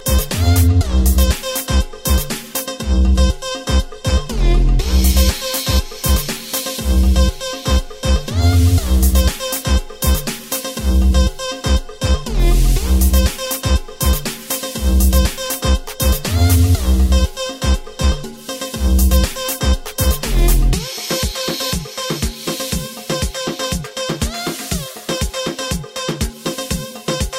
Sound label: Electro Chingon